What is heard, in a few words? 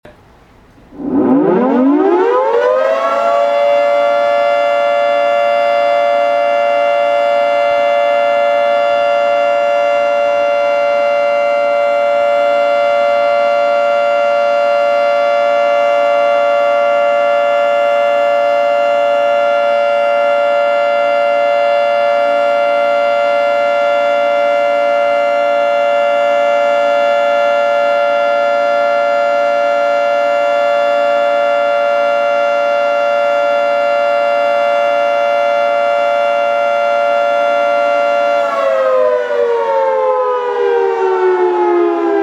Storm Siren; emergency services